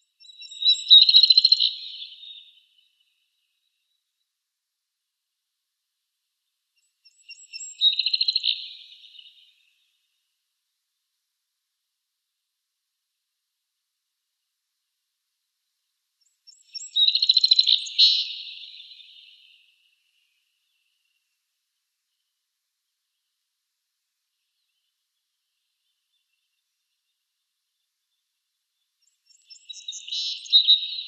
Song Sparrow song

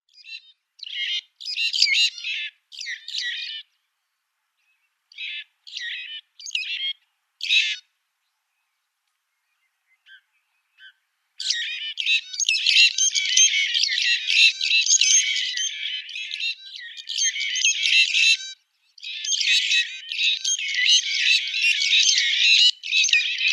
Grey-backed Fiscal calls

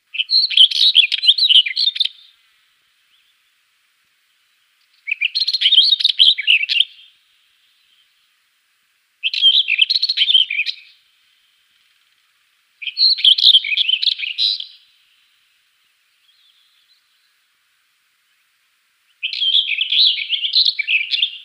Black Wheatear song

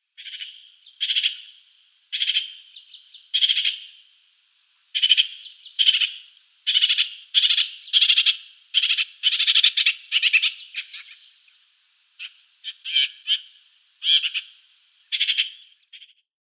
Eurasian Magpie mauritanica nestling

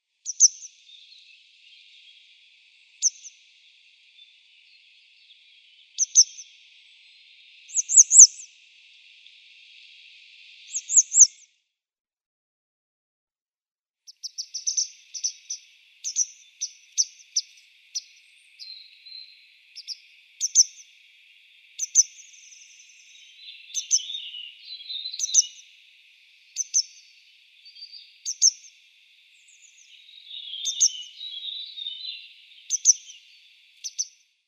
Grey Wagtail calls

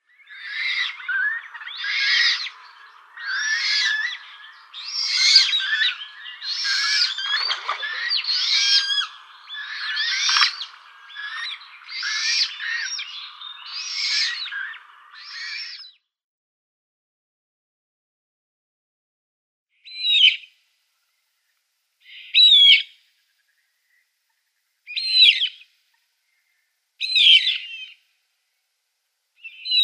Eurasian Coot downy Young

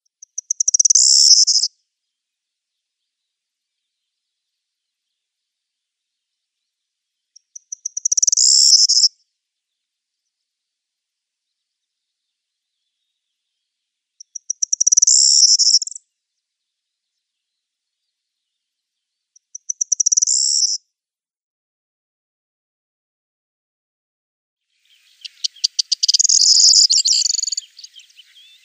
Corn Bunting song

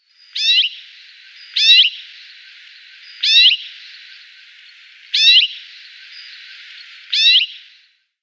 Common Rosefinch calls

